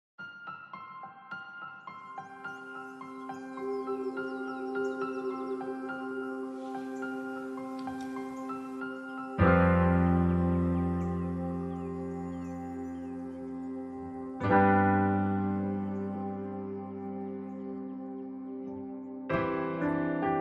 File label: Instrumental